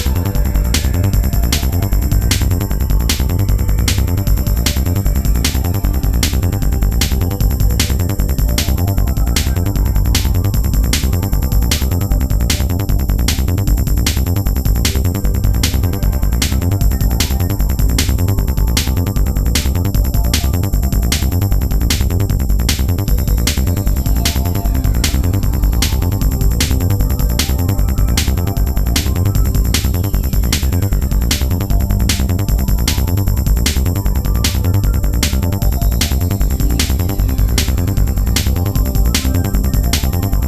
waiting Music; BigBrain

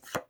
card draw

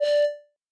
organ D4

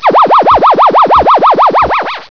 ufo low pitch; Space Invaders

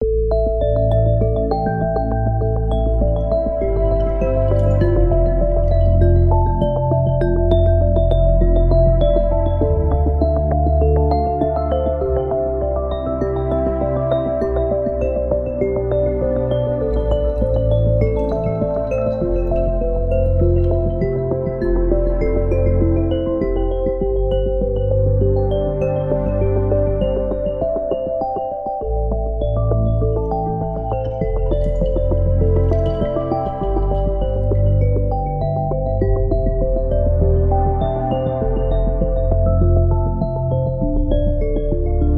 sample music

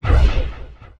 magic Shot

